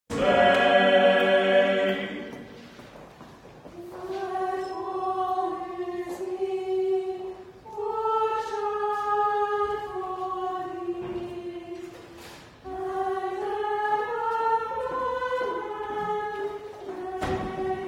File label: choir; wright hassall